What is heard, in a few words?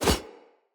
Split Sword Whoosh; Weapons; Player; Swords; Starter